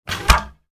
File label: Bow and arrow shot army archery